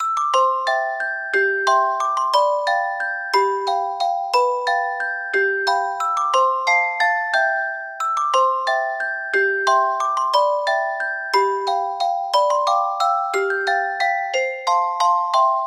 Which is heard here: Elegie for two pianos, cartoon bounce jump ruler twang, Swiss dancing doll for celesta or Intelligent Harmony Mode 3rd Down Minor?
Swiss dancing doll for celesta